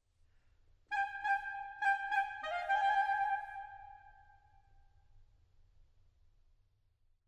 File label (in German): zaunkoemigmotiv klarinette